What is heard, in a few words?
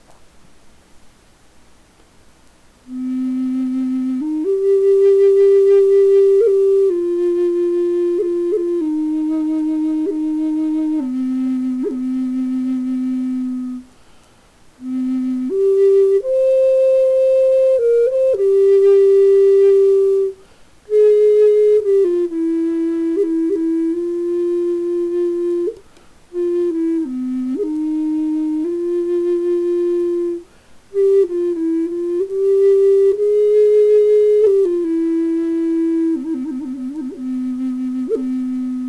Cedar low C, flutes